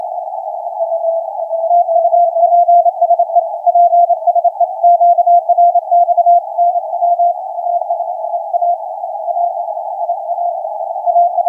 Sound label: 20m CW